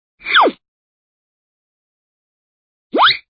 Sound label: Zip In Out; cartoons